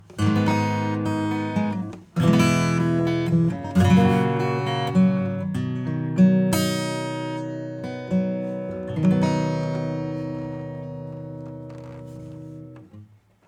acoustic bypass; AMEK

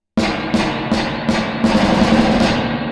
Spring damp low 0

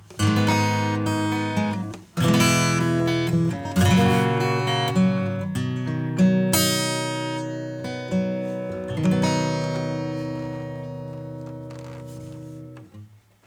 Harmonics acoustic; MODERN

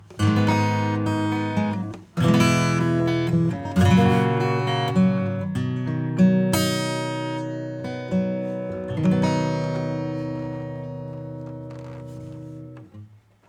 acoustic EQ default

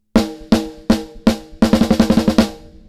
Delay TAPS 4 LOWPASS 20.0Hz; Cluster

